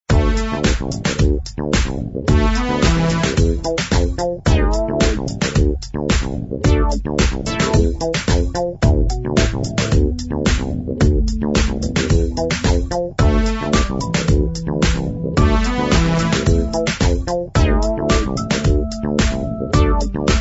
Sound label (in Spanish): fondo musica de baile